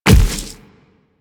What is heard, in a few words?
Infested Splitter Step Default, footsteps; Warframe; Grey Strain